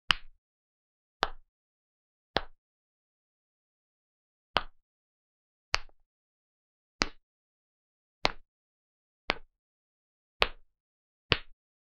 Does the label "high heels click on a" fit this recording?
Yes